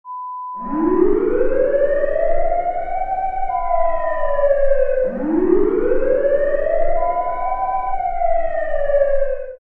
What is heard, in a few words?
Siren sound